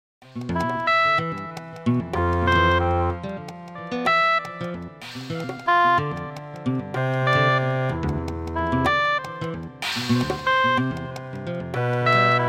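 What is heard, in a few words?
guitar oboe contrabass and percussion polyrhythm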